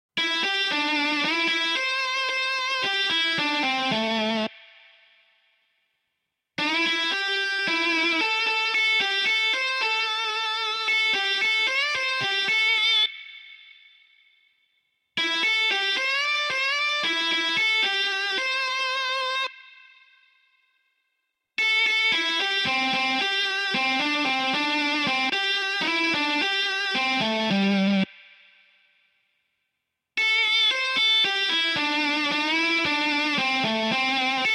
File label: Vibrato Exercises; solo techniken